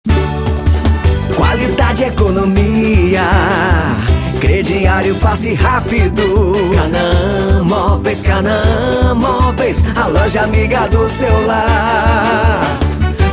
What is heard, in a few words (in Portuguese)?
jingle moveis; canaa moveis